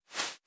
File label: placing Dust; voxelverse